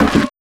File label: Paper Fx; Sonic Foundry (Sony Creative Software); Esoterik Beatz